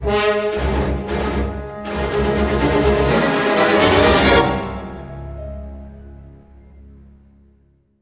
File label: music flourish, intro